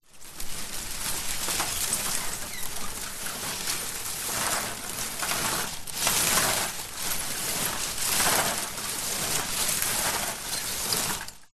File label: tree branch leaves rub rustle against building or roof in wind; foley; zapsplat